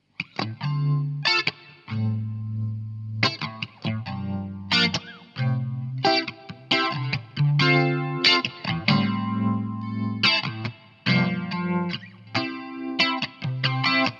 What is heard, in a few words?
Rotary slow; tonelab st; gitar